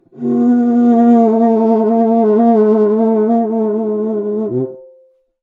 Cuica; Percussion Samples

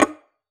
Classical Guitar Extended Technique

